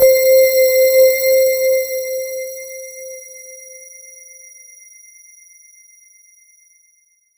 C4, Roland JV 2080, JUNGLE BRASS

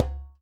JEM LO, Percussion; Sampleheads; NYC Percussionworks; ST PERC VOL1

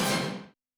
HIT, Yamaha SY85 Drums

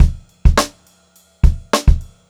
Funk Groove Drumloops; USB Soundscan vol.38; AKAI; 90 s Sample CDs

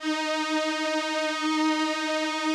VP-330, String Machines

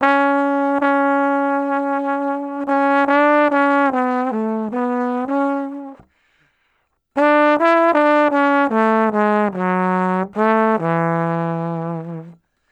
Trombone Solo, Jazz; Sonic Foundry (Sony Creative Software); Jazz Solos and Sections

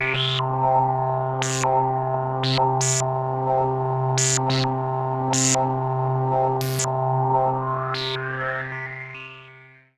Mechanised C, Bright; Trance Formation

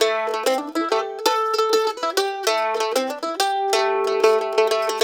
LICK, Blues Guitar

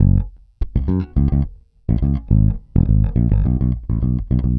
Pop, Bass

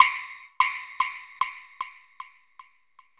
Echo Clacker; Sonic Foundry (Sony Creative Software) - Futurist Drum & Bass; Drums & Percussion

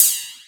Ride, Club Techno Percussion Cymbal; Zero-G

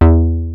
MOOG SOURCE; Kid Nepro; Elite

